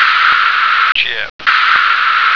chip

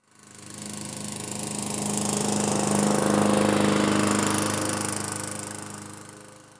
mower

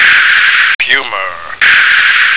puma

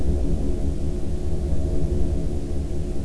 space

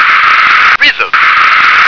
rhythm

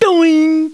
bell

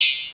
DROP

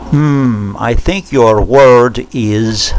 mmm

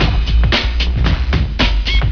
DRUMS

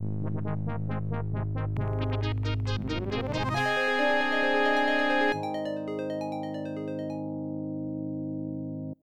Sound Blaster Version Fanfare; Boppin'; Apogee Fanfare

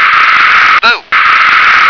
bow

